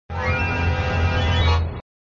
Sound Multiball start dramatic jackpot chance (ramp opto), flipper